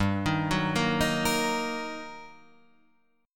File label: G Minor Major 7th Sharp 5th, G, down, Guitar, Standard; GmM7#5 arpeggiated; JGuitar